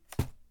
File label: Throwing; Phasmophobia